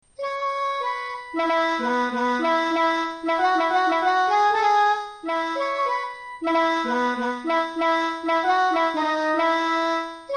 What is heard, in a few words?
Lead